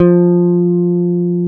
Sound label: FEND P-BASS F3; Sonic Reality - Vintage Timetraveler